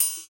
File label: PRC COIL, Perc Toys; Spectrasonics; Supreme Beats; World Dance